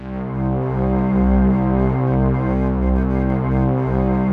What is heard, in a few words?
JV-1080 WARM SHIM; VINTAGE-EXP